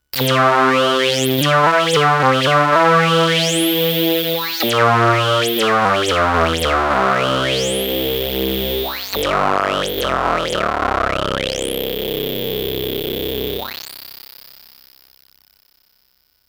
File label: newsynth